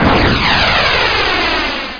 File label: Warp On; Missions Reliant!